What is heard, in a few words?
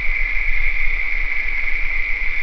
swamp